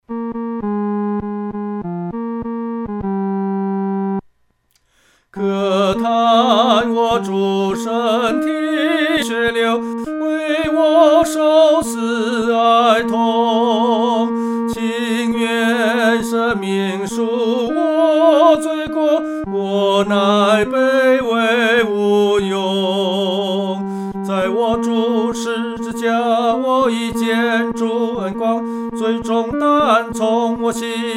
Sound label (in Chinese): 独唱 第三声; 在十字架